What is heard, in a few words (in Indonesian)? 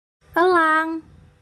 elang